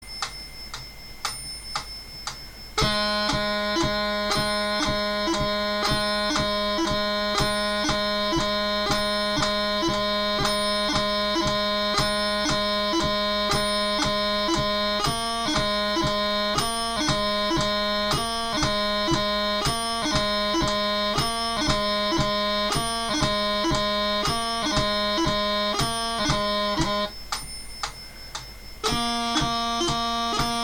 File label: 116 bpm